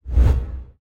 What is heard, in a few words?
transition